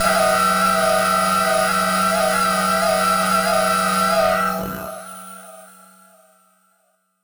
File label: Battered Synth; malfunctioning synth samples